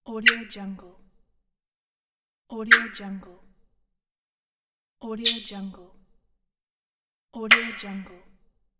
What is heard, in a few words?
squeaking footwear